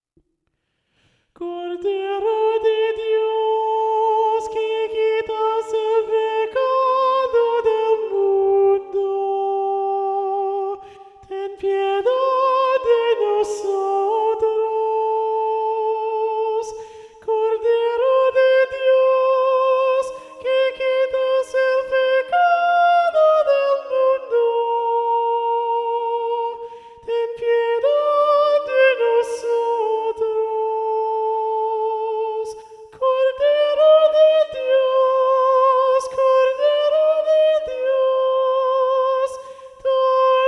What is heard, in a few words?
Treble 3 Predominant; Cordero de Dios